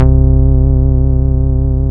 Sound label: SYN Strange PPG; Best Service Gigapack 1